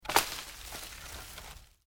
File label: LEAVES DEAD MOVE, Rustling; Material components for constructing more complex sounds; Objects